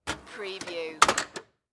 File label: Toolbox Drawer Open